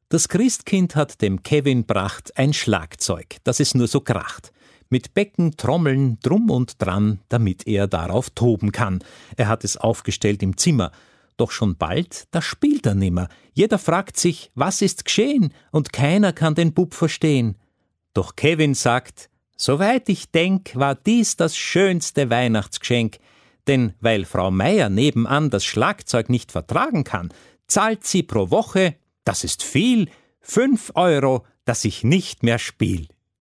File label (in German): Das Schlagzeug